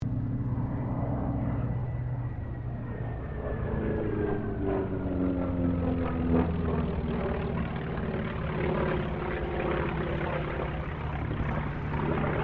AIRCRAFT T-28D TROJAN PASS DISTANT; Airplanes (Sound Effects:Vehicles)

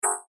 Beep Tone Signal Multimedia

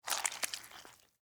Slug Crawl Move, Slimy, Wet; Animal; Insect; Bug; Worm; BlastWave

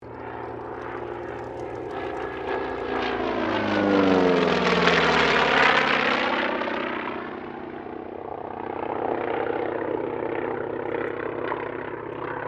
AIRCRAFT T-28D TROJAN PASS; Airplanes; Sound Effects:Vehicles

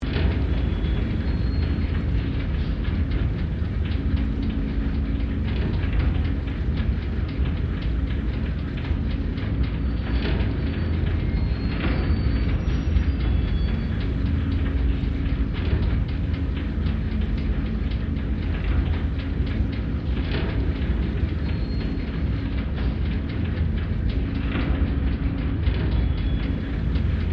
Steampunk Engine, Scifi, Steampunk, Engine; Science, Fiction; BlastWave

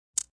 Click; Clicks

